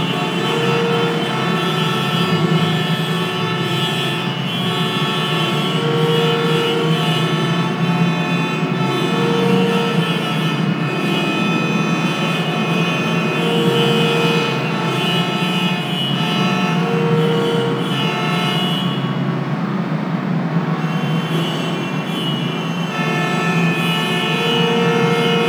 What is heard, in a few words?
city heavy traffic jam; ambient; Project Gotham Racing